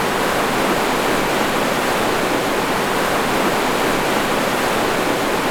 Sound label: waterfall; Swamp Of Darkness